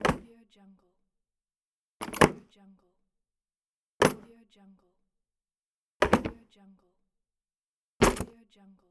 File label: toolbox close